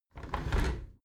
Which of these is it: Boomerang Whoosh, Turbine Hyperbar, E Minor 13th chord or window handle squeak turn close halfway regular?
window handle squeak turn close halfway regular